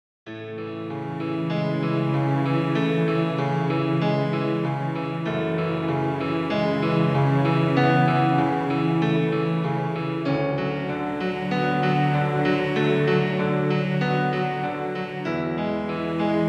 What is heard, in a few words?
Romantic Climate Background Music